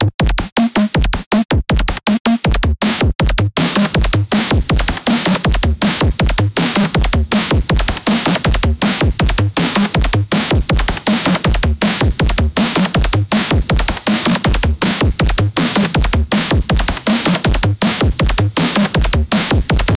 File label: a piece of an extratone, apocalyptic